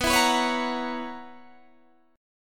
B Major 7th Suspended 2nd Suspended 4th B down Guitar Standard; BM7sus2sus4; strummed; JGuitar